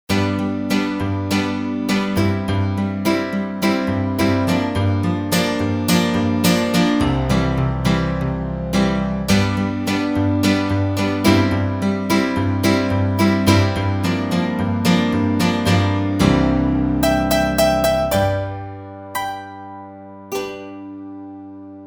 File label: Pop Piano 1, Roland JV-880; Factory Patches